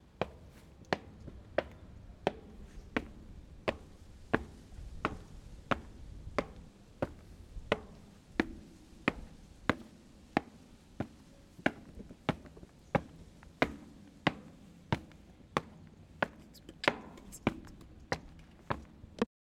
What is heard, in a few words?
Walking On Concrete Stairs